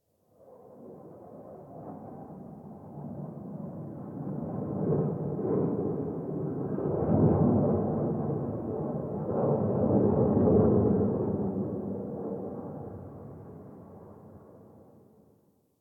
Distant Ambient Fighter Jet; Warframe; Nineteen Ninety Nine

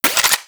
Anti Aircraft Gun Mech End; Weapons; Warframe; Archwing

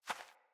Beaver Dig; Foley

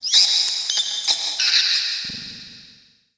Rat Vocal; Ambience; Crypt; Champion Crypt

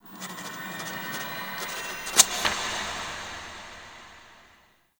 Echoes Paper Scribble; Warframe; Ambience; Entrati

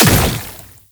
Brood Projectile Impact; Warframe; Oraxia

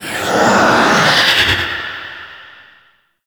Reaper Vocal Turn; Warframe; Wraith

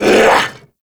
Vocal Attack Short; Warframe; Duviri; Dax

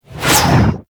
Scythe Whoosh; Warframe; Weapons; Stalker Scythe

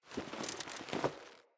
Foley Move Short; Friendly; Deora

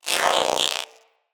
Vocal Pant, Pets; Zanuka; Zanuka Companion A

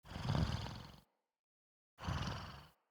Wolf Cub Sleep Idle Breathing; Vocals; Orengal Wolf Cub; Animals

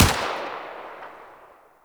Shot, Shotgun; Warframe; Eidolon; Shotgun Lancer

